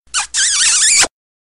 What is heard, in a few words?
Kissing Sms